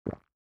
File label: drink